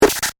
Glitch Short Circuit, Glitch, Short, Circuit, Beep; Production Element; Glitches; BlastWave